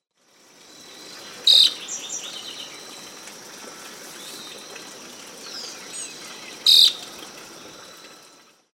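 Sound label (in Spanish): Fiofío Moteado, Elaenia gigas, Mottle-backed Elaenia; cantos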